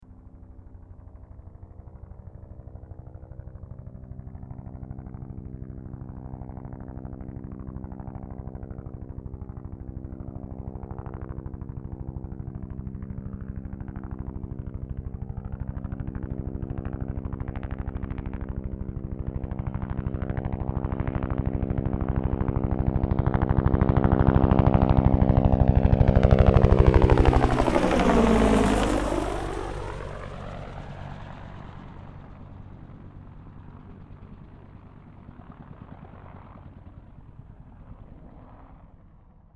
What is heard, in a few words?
helicopter 1967 bell uh1 iroquios pass stereo